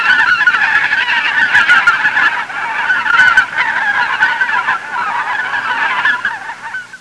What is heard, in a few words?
geese sounds